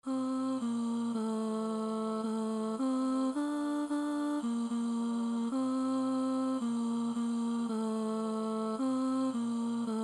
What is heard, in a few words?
Chorus Alto